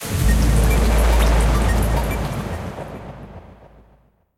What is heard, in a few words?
win big; Rabcat; Classic 243